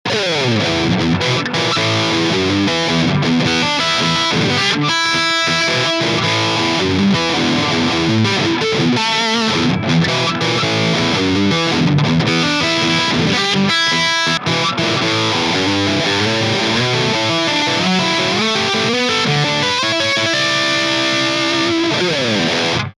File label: DISTORTION